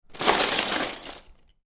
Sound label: Lego Rummaging In Lego Box; Miscellaneous Toy Sound Effects; Sound Effects:Toys